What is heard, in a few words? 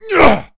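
moan, male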